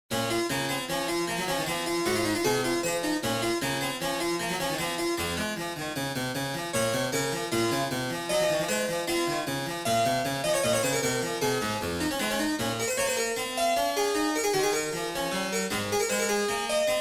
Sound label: 19 edo A Prelude; Xenharmonic Alliance